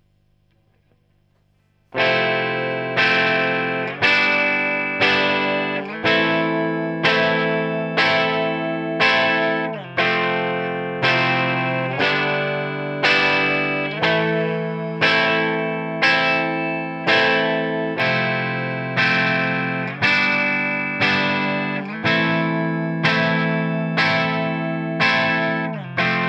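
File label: gtr, guitar; rode nt2-a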